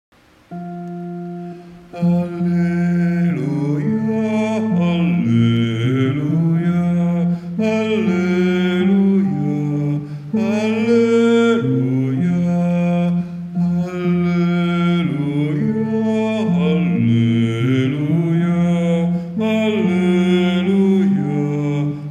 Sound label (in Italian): Alleluia Uomini; Lourdes 2017